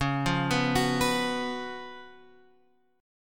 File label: C sharp 11th C sharp; C#11 Chord {9 8 9 X 7 7} arpeggiated